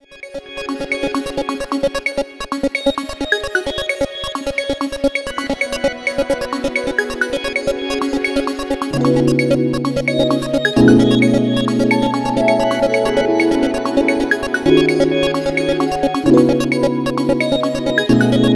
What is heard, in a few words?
Puzzle, ringtones; Optimus L3 II E430 Movistar